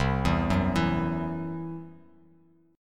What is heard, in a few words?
C-Major Flat 5th, Bass Standard; arpeggiated